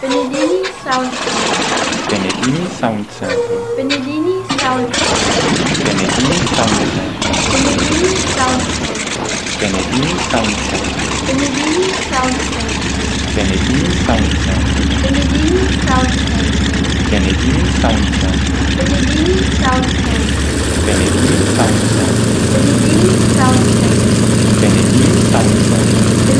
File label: Jak55